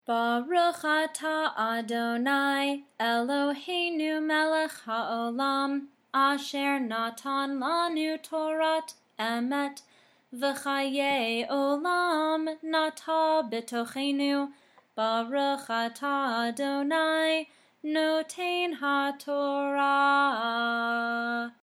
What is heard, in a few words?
Blessing After Torah Reading